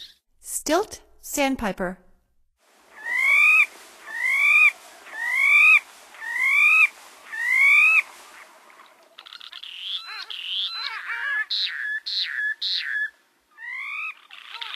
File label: Stilt Sandpiper; North America; Stokes